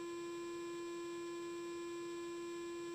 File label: G4 normal, Clarinet